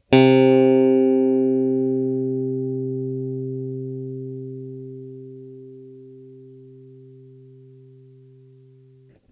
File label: strat